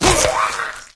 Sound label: wolf; cstrike